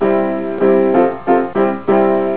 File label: piano